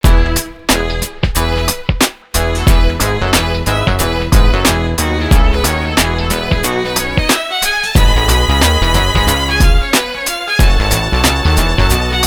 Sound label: violin concerto 1st movement; bwv 1042